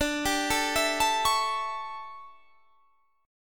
D Major 7th Suspended 2nd Suspended 4th D down Guitar Standard; DM7sus2sus4; arpeggiated; JGuitar